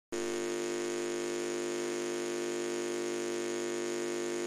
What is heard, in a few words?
noise